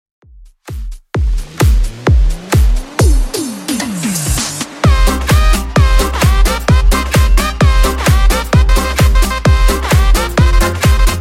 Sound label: 2ks Latin Redrum 130bpm